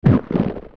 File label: Grab; Like Like